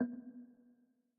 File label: Move B 3 Mp